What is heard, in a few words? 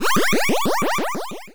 achievement attained